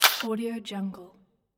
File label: pistol inserting clip